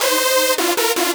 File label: custom synth